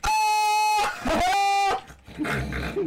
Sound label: aaaaaaa aa korean guy